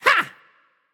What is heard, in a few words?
grunt attack, voicepack; smite; jorm